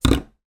household oil spray bottle down on kitchen worktop